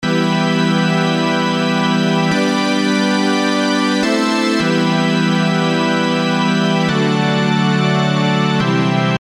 Synth Dry

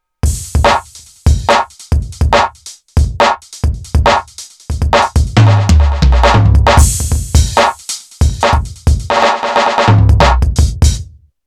Roland TD-4KP

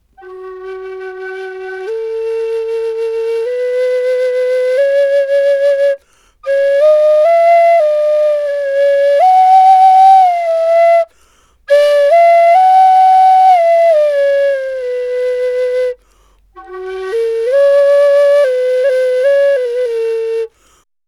Flute

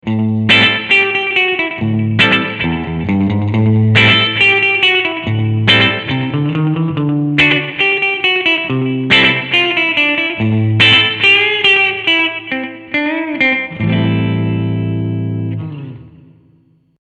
Vypyr 100 Brit

